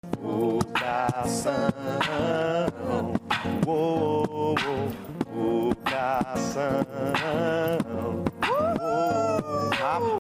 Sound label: Brazilian Beatbox Nuns